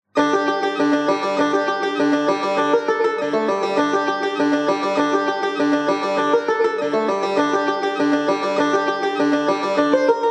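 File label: study of esfahan, Tar; first book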